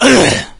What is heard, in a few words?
zombie attack; doom3; fatzombie